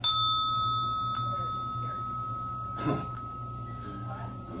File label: Ding